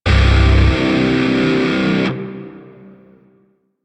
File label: Shawzin Phrygian Chord D; Grineer; DE; Warframe